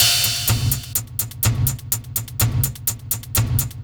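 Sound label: Metal percussion, Drum Loops 125bpm, Nu Dance Electro; Zero-G; Soundclash Nu Dance Electro; Acidized Wavs; Tommy Metal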